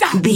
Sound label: voice male hurt pain